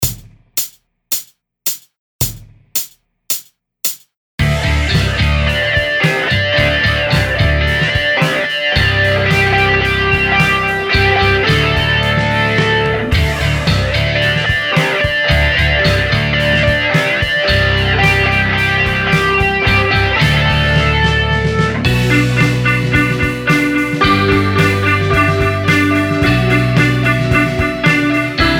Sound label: Power Chord Rock; Wagner Int. Book 1